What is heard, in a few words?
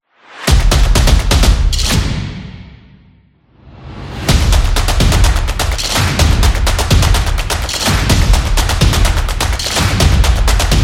This Is Stomp, percussive